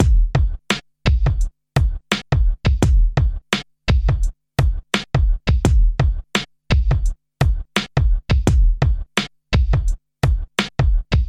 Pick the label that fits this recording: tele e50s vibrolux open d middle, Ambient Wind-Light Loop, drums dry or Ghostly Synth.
drums dry